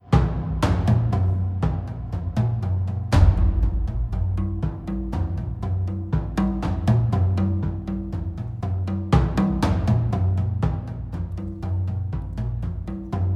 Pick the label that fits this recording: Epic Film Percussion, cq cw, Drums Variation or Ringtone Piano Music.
Drums Variation